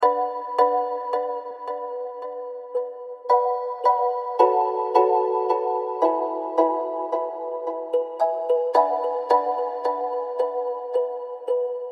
Tape Delay

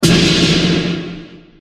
snare

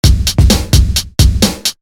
drum

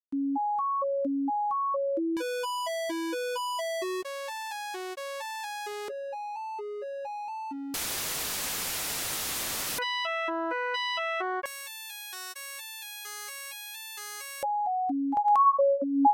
Union Osc

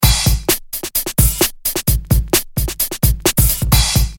FPC Drumloop

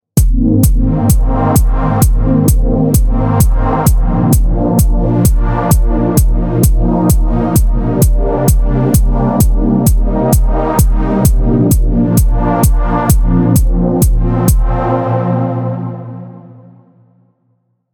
pad with drum

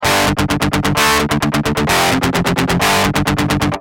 Guitar no fx

